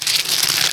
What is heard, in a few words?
Rip Tear Flesh